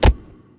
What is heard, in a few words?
free Spin Count Up; hiddencoinsofegypt